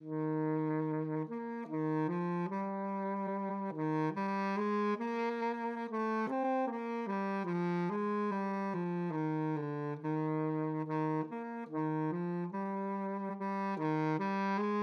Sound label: Tenor Saxophone Etude; 7th Grade